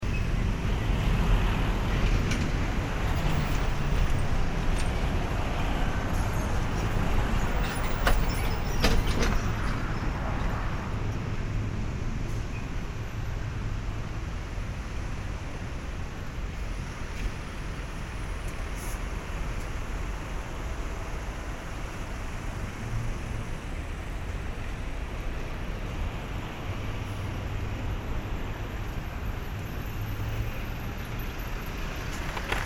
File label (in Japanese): 交通量が多い交差点の環境音